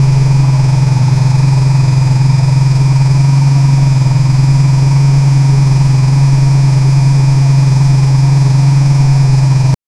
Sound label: motor v8 supercar idling